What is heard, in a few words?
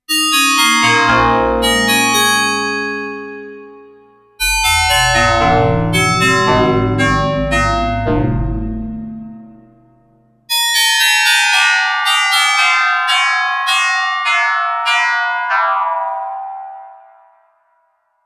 Korg Shift - FM Bell